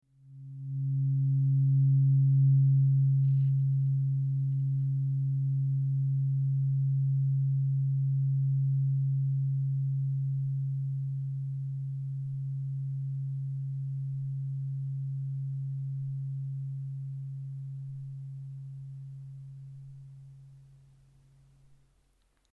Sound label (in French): diapasons potentiels corticaux lents; om schumann